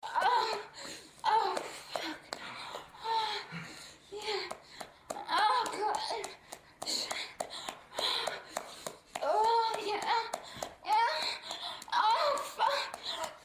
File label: cum, moans; The Witcher Hunt